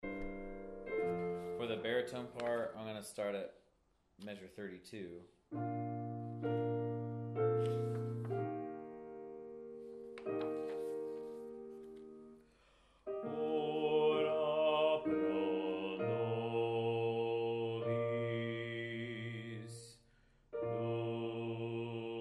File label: Baritone; Ave Maria, F. Biebl, Baritone; Choral Resources